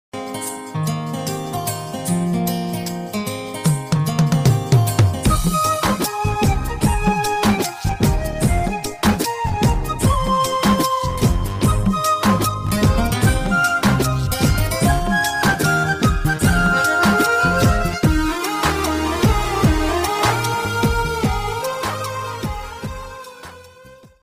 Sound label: romantic bgm; runam movie; gopi krishna; mahendar; shilpa